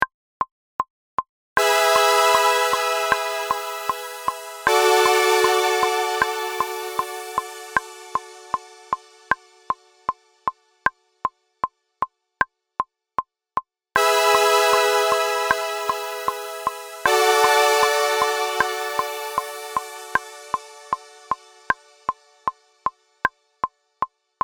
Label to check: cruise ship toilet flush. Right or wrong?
Wrong